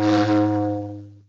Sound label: bottle, Synths; Notes